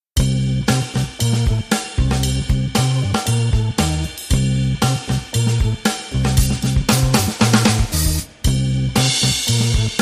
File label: Drum & Bass